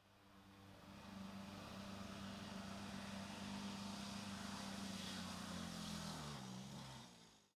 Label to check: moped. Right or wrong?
Right